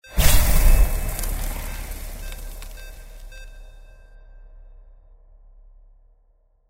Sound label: sci fi magic ice start; windy beach audio